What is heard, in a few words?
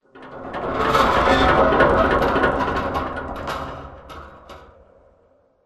metal stress med; Titanfall 2